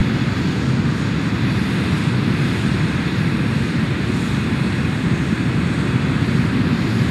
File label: Jetcar Idle